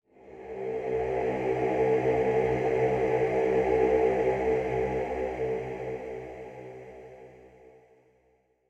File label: amb hymns tonal; Blackfaun